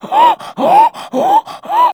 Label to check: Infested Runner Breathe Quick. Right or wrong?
Right